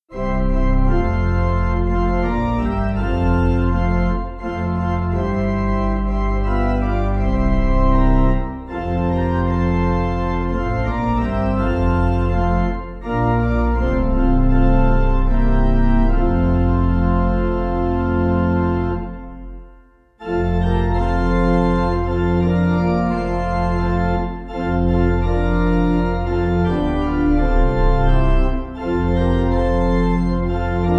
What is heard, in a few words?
Pipe